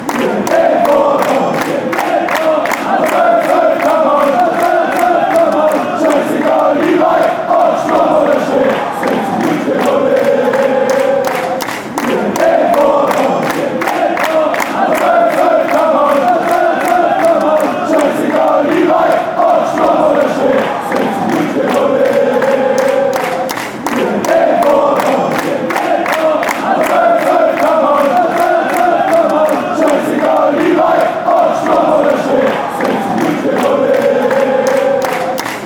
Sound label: fan chants; sechzig munchen